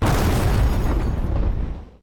boom; BALLY; TWILIGHT ZONE; Pinsound

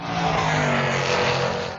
circuit car; BALLY

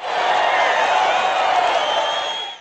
crowd winning; BALLY

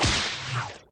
whip smack; sfx

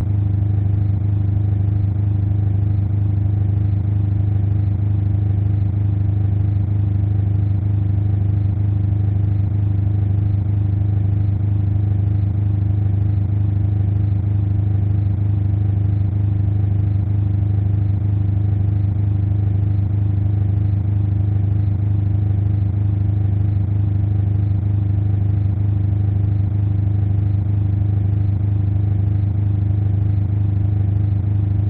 engine ambiance; BALLY; CORVETTE

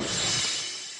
mine cart track change; sfx

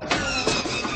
plane not starting; sfx

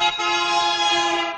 horn; BALLY; CORVETTE

